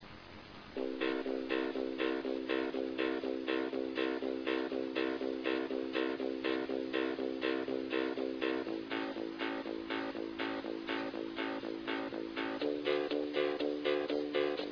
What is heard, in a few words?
taiko